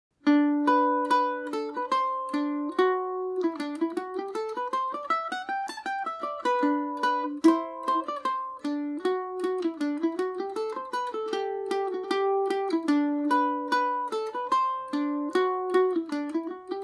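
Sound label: G major paris swing, irish mandolin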